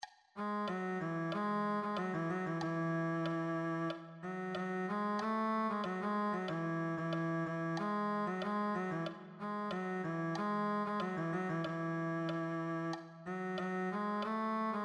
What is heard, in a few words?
cello